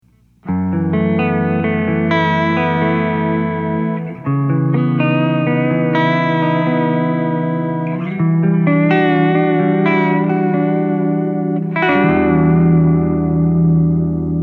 Les Paul

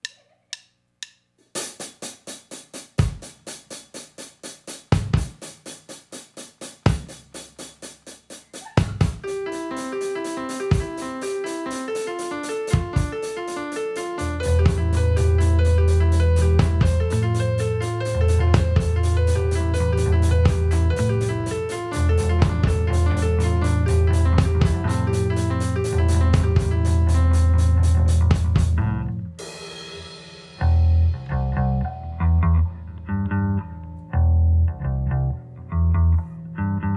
Instrumental; Summer Camp 2014

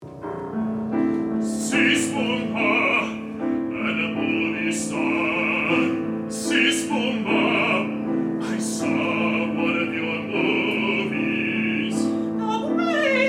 Theremin; Madame Theremin Scene 2

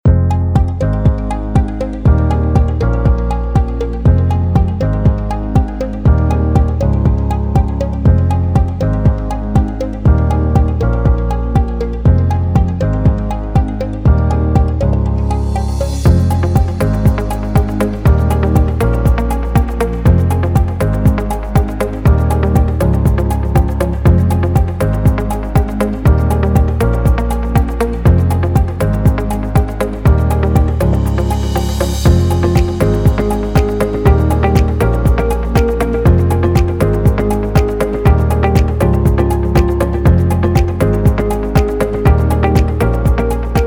Upbeat

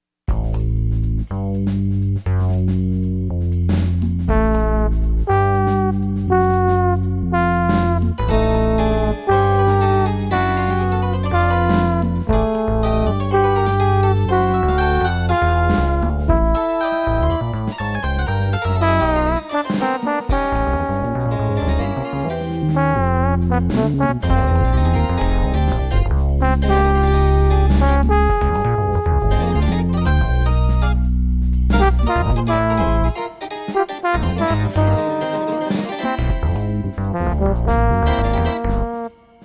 Free Jazz

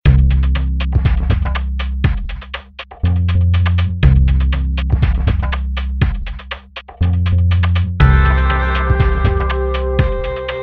Instrumental

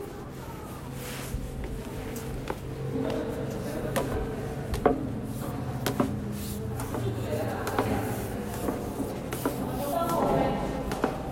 Symphonic Sculpture, Hakone Outdoor Museum

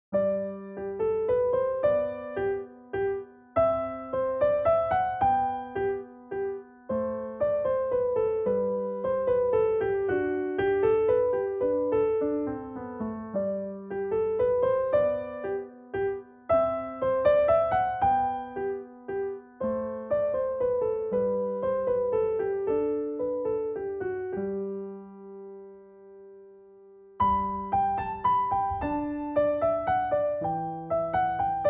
minuet

